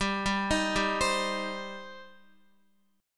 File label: G Suspended 4th Sharp 5th, Guitar; Gsus4#5; arpeggiated; x,10,7,8,7,8; 4F,4A,5C,5G,5C,6E